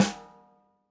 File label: snare, gretsch kit